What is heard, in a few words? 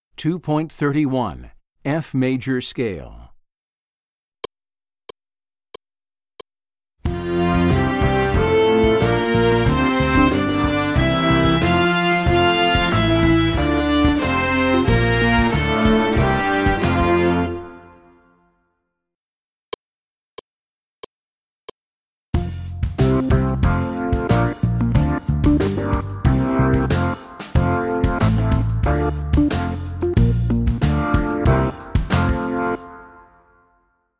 F Major Scale